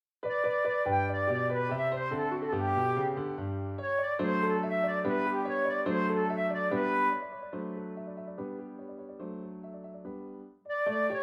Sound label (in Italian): Allegretto alternato per pianoforte; Moller